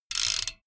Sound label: bmx bike crank freewheel chopped medium